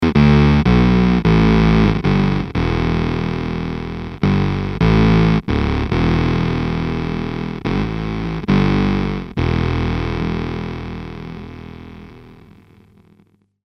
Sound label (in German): Gitarre mit Gitarrenpreset; Subdecay Pixelwave